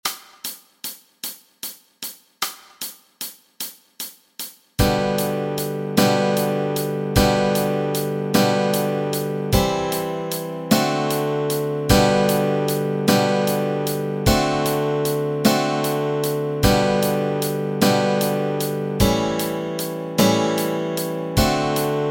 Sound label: chords, Easy Guitar and Ukulele